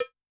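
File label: Cowbell; Yamaha DTXpress-II; Perc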